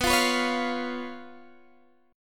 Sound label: B Suspended 2nd Flat 5th, Guitar, Open D